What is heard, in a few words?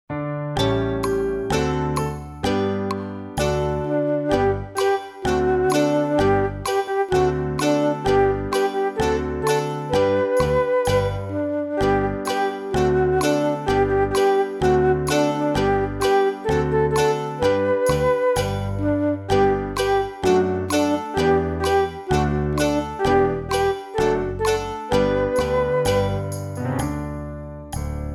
Zacchaeus instrumental